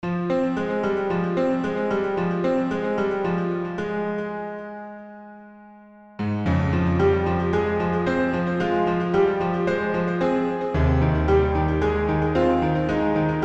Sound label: Golden Win (piano); Loop Hero soundtrack